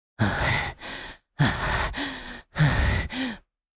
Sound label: Heavy breathing anime boy vocalization